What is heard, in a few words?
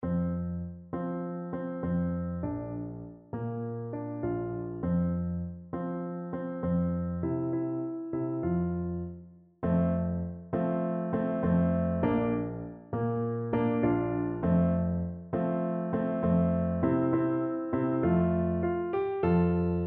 take time in life piano